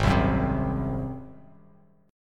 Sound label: G sharp 7th G sharp, Bass Standard; G#7; strummed; JGuitar